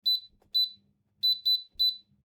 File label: Microwave button beeps; appliances